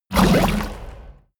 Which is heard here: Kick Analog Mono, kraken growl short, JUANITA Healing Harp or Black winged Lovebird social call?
kraken growl short